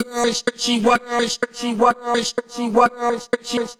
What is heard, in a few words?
126 Bb VOCAL LOOP, Funky Underground; Loopmasters; Funky Underground Vol 2